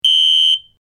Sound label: Alarm Whirring Beep; hi-tech-various